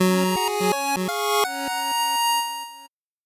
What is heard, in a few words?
8bit jingle fast